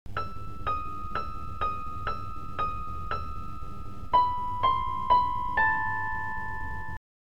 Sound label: Musical KEYS